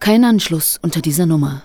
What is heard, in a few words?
vox, Techno Trance; Ueberschall